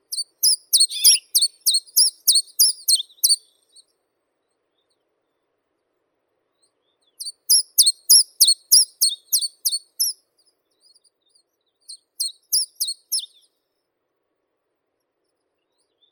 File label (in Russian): penochka kavkazskaya phylloscopus lorenzii